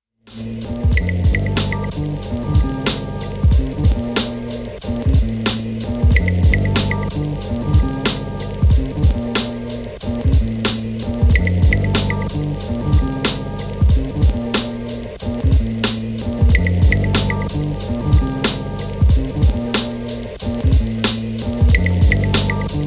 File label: beat